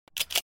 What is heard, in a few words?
reload